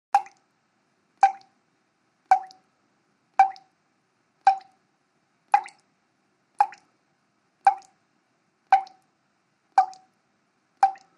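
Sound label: Water Drips Slow Medium, drips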